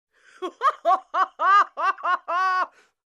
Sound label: Hysterical Laugh